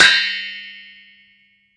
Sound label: Metal Ring; ModeAudio Found Percussion Hits